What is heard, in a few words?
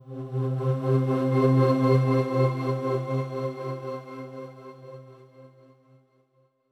basic Mood